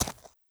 horse footstep dirt hard; Rust

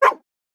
whimper; Rust

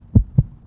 heartbeat slowmo; airplane

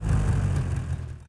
engines; World of Tanks

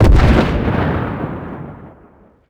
tarantula explode far

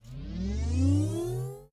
ship engines; World Of Warships

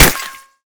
impact dirt; Modern Warfare

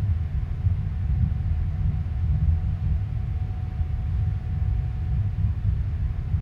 submarines engines; World Of Warships

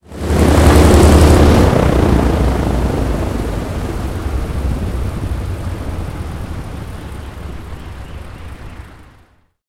engine stop, engines; War Thunder

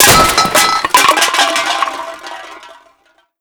trash debris; Explosions; Call of Duty Modern Warfare

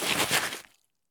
stop asphalt; Escape from Tarkov

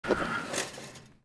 Sail Up; Civ4 warlords

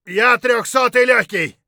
hurt light; Escape from Tarkov

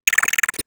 drone chirp

